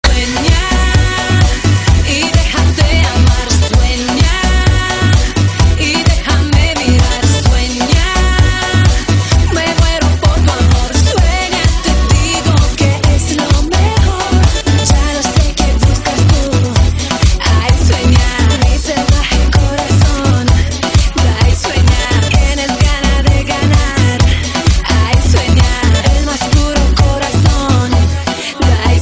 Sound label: Narcotic Sound